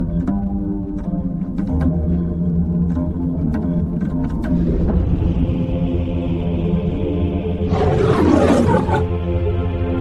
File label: begena